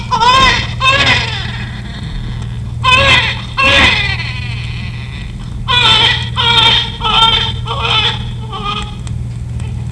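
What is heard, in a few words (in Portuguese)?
RN TERMO, SONS PEDIÁTRICOS; normal; PEDIATRIA UFF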